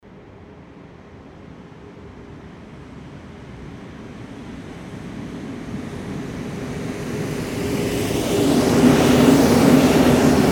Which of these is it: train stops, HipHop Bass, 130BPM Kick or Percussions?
train stops